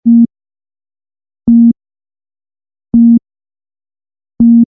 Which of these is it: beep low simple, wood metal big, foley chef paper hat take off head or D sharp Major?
beep low simple